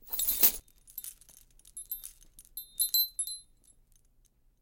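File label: ringing clang of a memorial medallion; zvonkij lyazg pamyatnogo medalona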